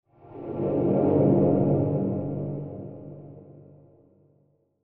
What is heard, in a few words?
Ghost Presence Communicate; Horror; Ghosts; Blastwave